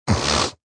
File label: Snort, Snort, short, (human voice); Snorting; VoiceBox